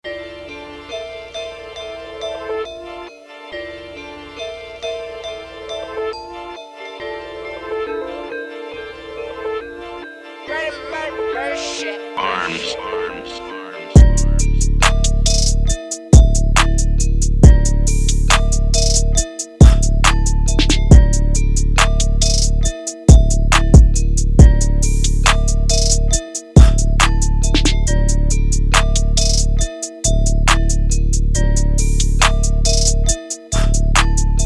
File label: instrumental